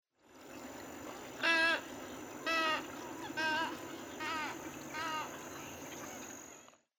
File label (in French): Aigrette tricolore